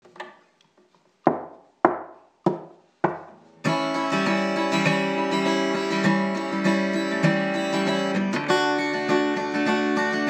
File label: instrumental